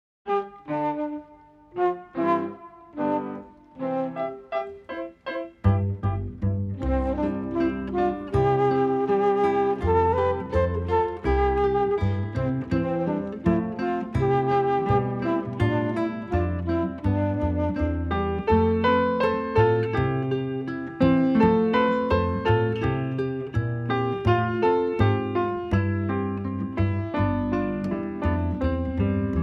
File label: instrumental